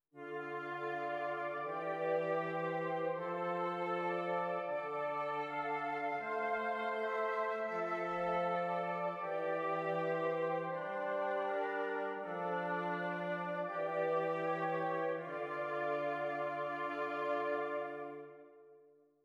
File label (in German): Stimmiger Contrapunkt; Ronge